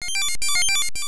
Shrink Tune; Zelda; MC

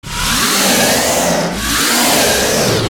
Die; Ganon; zelda; OOT

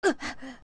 Young Choke; used when Link dies; OOT

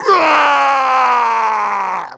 Moblin Die

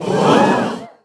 Crowd Gasp

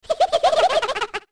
Ocarina Laugh

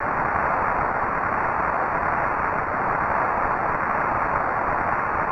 Rain In; Zelda; LTTP

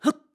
Climb Jump; Link jumping while climbing; BOTW; Breath of the Wild

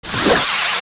Wallmaster Hit; OOT

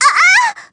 Smashed; Zelda

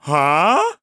Huh; Gondo: "Huh?"; Skyward Sword

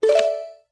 Pause Menu Save; Select "Save" on the Pause menu (Recorded)

